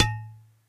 item bottle pickup

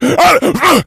rage at victim, infected, male

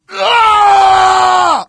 crit death; video games; source engine; tf

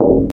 glass footsteps, infected walk; player; source engine; left 4 dead 2

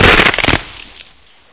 headbite, headcrab; Source Engine; bshift

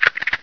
ag idle; agrunt; video games; source engine; bshift

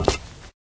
black tile, footsteps

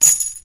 chain impact soft, metal; physics; Source Engine; cstrike

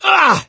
hurt major, gambler, survivor voice

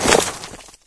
ice, player footsteps

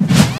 taunt upper cut; video games; player; heavy; source engine; tf

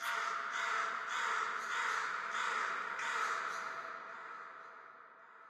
amb crow; video games; ambient; nature; source engine; portal2

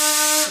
dog servo; Source Engine; video game; npc

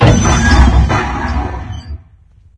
fan spin long; video games; ambient; source engine; portal2; outland

